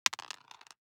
DICE on Cardboard, Throw and Roll, Mini, 1 One Die; Samples; ArticulatedSounds; DICE Sfx Party (Extended)